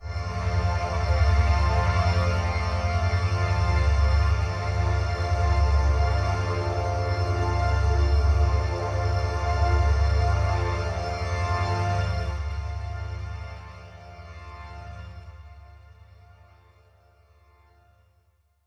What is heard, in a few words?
One Shot Drone F, dystopian drone; One-Shot Drones